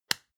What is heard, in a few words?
lotion large lid close medium click; berufe; friseur